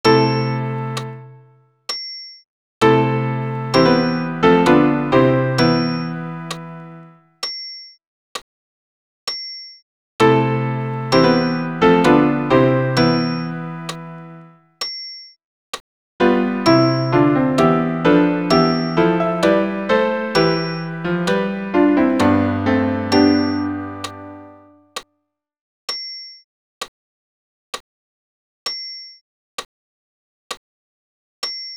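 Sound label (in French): Agnus tous piano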